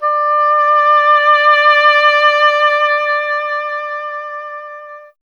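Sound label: WIND OBOE; Miroslav Vitous; Solo Instruments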